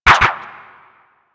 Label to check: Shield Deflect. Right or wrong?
Right